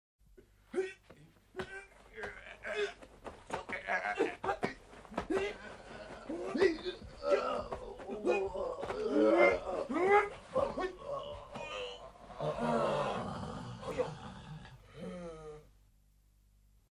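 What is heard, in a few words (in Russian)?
убийства карате удушение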